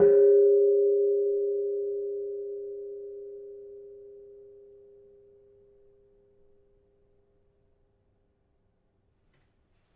Kenong Pl 4, gamelan; gravityharps; timbrebox

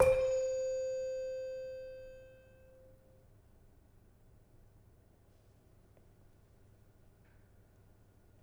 Demung soft, gamelan

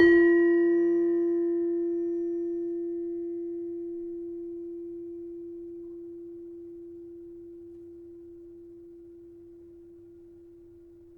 Demu 4 F, gamelan tuned

